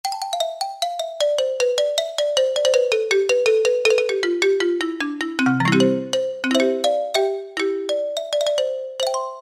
Xylophone, Roland SR-JV80-02 Orchestral

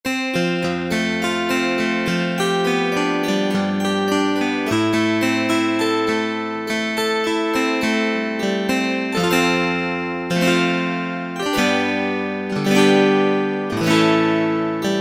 12-str. Gt, Roland SC-50 Sound Canvas; Factory sounds

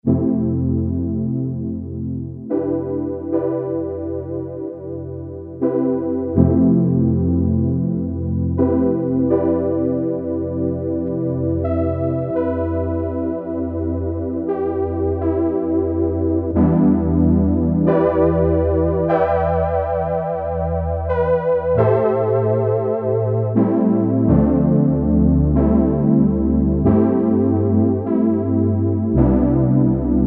PROPHET 5 MELLO

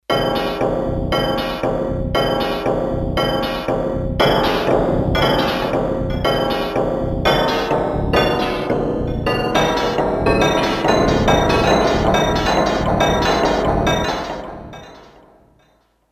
Ironworks, Roland D-10; Factory Patches

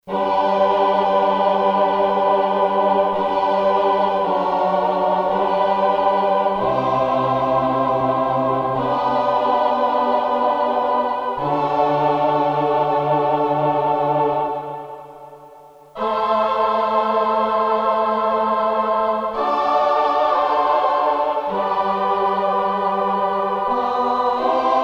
Choir

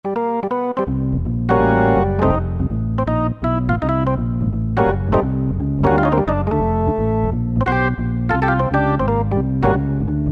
Perc, Yamaha TG33; Factory Presets

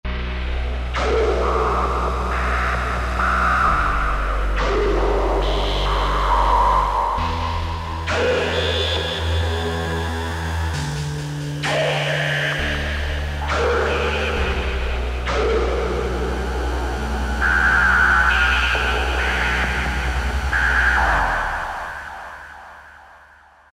Industrial; Roland SR-JV80-11 Techno Collection

